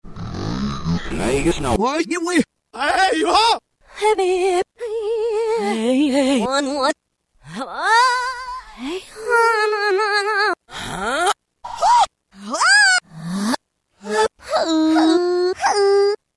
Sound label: Rev Vox Menu; Roland M-DC1